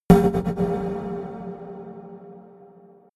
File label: energized fuse; whooshes-rises; hits-impacts